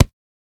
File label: Short foley